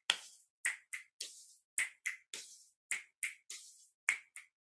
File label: body percussion